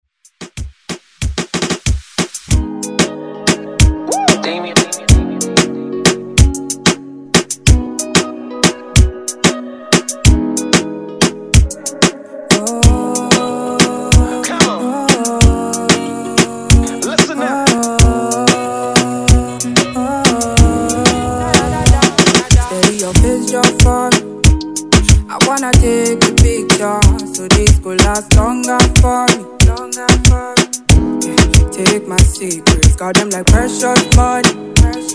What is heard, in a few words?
Afro Rton Redrum